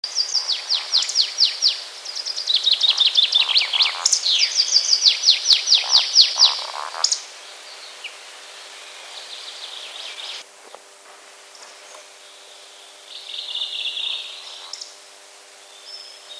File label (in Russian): valdshnep i drugie pticy